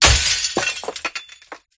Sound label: ceiling break